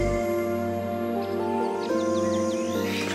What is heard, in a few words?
Birdsong Transition